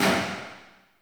Tail Generic Interior Medium; Warframe; Weapons

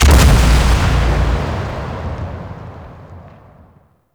Void Dragon Orb Proj Explosion; Enemies; Abilities; Warframe; Duviri

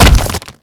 Bolt Impact Torso; Warframe; Cross Bolt

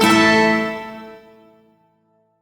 Shawzin Chromatic Chord; Warframe; Duviri

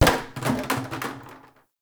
Plastic Hit

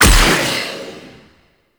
Shot Fire; weapons; Noctua

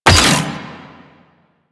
Drone Weapon Fire; Warframe; Corpus; Corpus Lich

